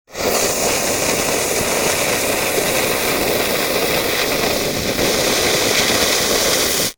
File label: FUSE BURN